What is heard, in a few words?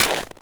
Step Ice, Footsteps; Warframe; Grineer; Royal Jester; Foley